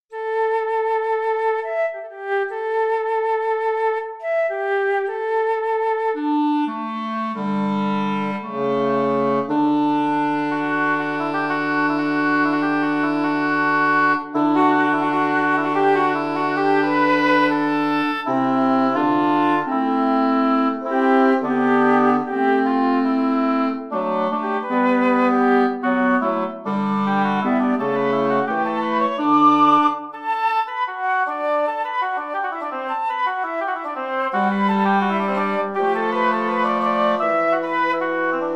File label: 12 equal winds compare